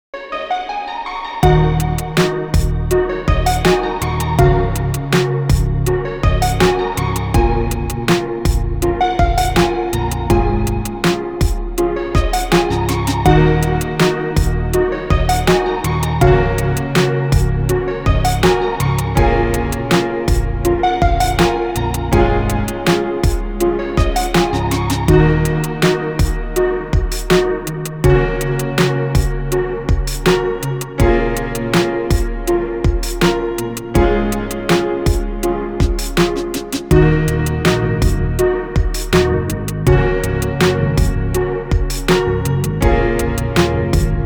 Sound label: Chill Hop; M-TRON MKII